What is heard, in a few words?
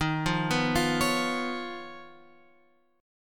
D sharp 7th Suspended 2nd Sharp 5th; D#7sus2#5 Chord {11 8 9 10 X 9} arpeggiated